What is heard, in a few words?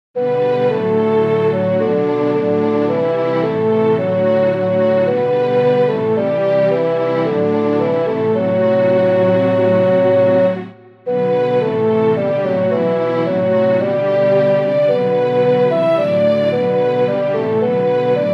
keyb